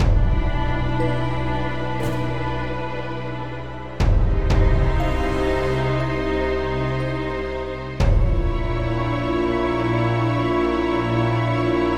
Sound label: Fear; Age of Wushu; Windows